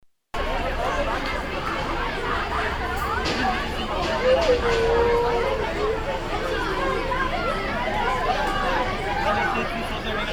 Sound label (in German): Ansammlung Kinder im Volksgarten